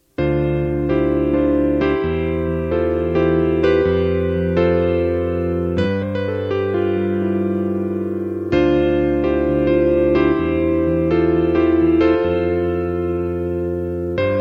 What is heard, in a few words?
NE3 PIANO TRASFORMER